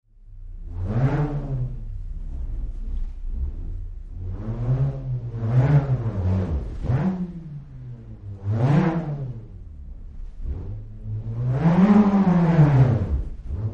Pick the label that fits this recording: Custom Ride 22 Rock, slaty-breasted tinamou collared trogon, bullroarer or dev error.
bullroarer